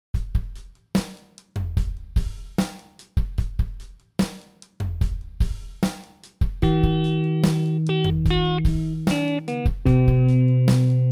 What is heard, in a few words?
slow